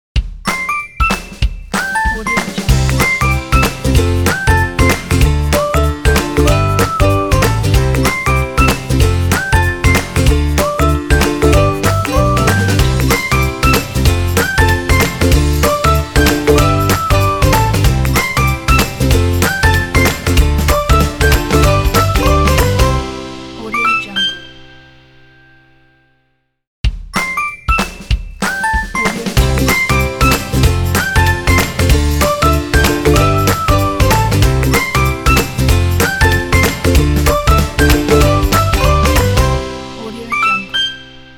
happy fun intro logo; puremusic

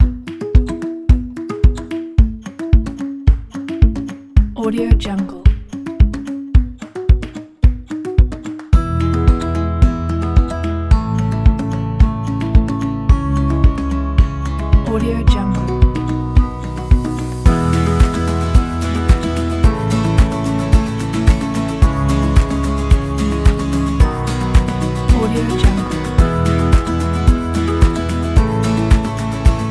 happy

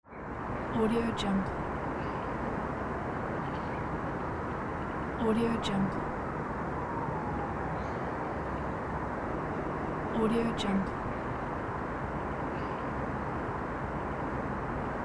city night; n-audio

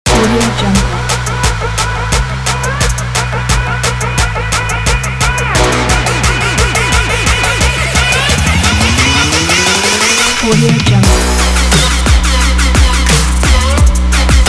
this is trap

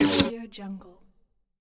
scifi container

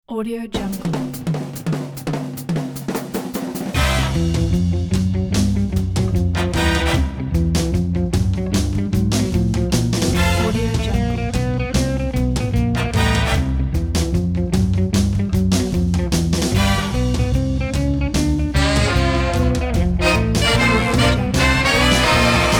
a swinging mood

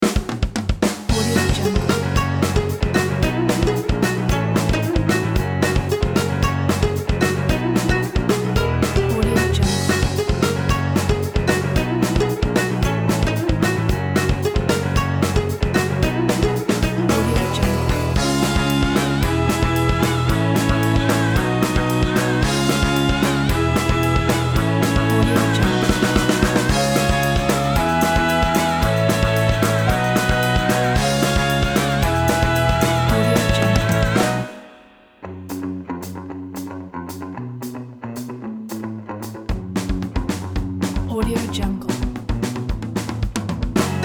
korean punk loop

